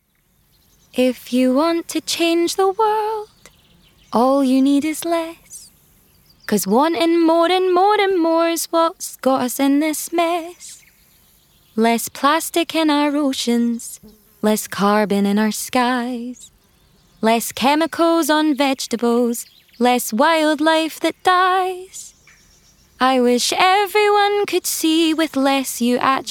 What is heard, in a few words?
Singing